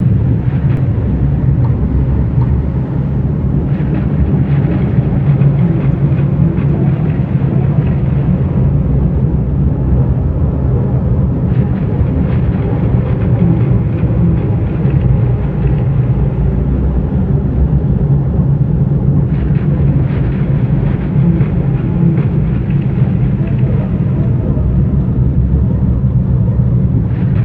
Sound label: MONSTER Scarier; Farlayer; Barotraumatic